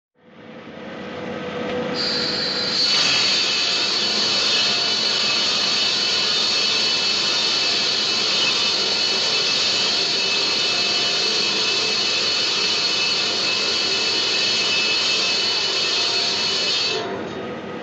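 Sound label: Sounds inside a boat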